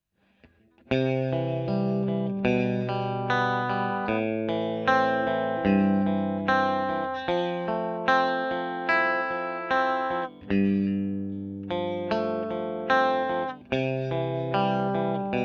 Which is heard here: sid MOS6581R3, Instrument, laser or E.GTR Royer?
E.GTR Royer